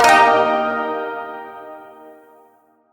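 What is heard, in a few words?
Shawzin Pentatonic Minor Chord H; Chords; Warframe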